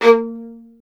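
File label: STR violin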